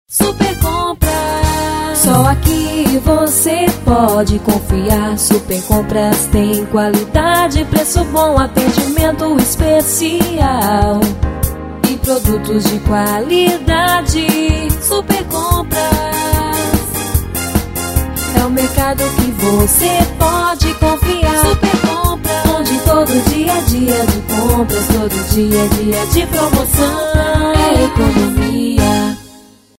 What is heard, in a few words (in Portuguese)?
jingle